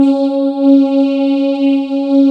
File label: VOCODER; Sound & Vision - Gigapack I CD 2